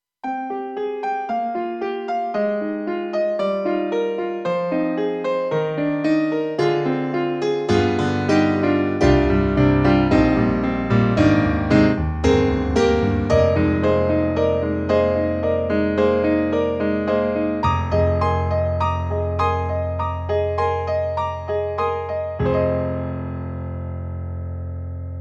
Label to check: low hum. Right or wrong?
Wrong